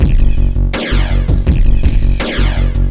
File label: groove; MAXOVER